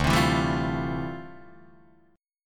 D Minor 11th D down Guitar DADGAD

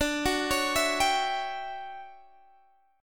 D Major 11th Guitar Standard, chord

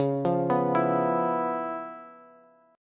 Db 7th Sharp 9th Db down Bass; Db7#9 arpeggiated; JGuitar

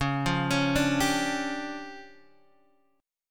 D Minor Major 7th Double Flat 5th, C sharp

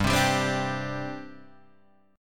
G Suspended 4th, Guitar, Half Step Down

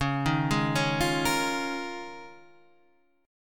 Eb 7th Suspended 2nd Suspended 4th Db

